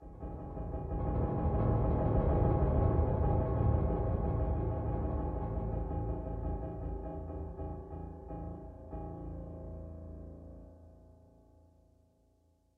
Cave Piano; Far Cry

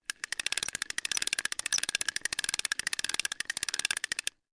Effects Crank

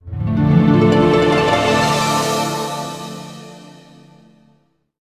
Opening (Stinger); medievil game rip

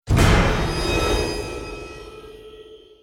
Stinger Action Short

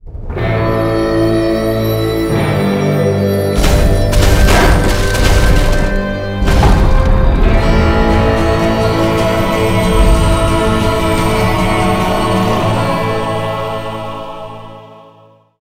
Lottery Win Jackpot

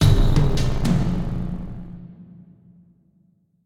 Sting Fail Slomo

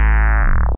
Alien Creak; Space

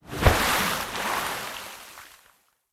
Car Water; Smashy Road Arena android ios 2016

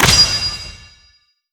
Unlock; Pac-Man Championship Edition 2 Plus

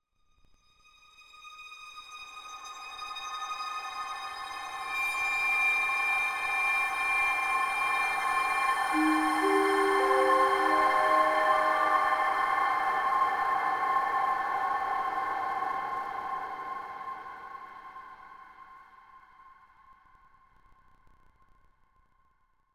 environment; backstab; android

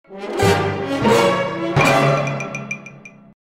Bridge Jingle; Asterix at the Olympic Games

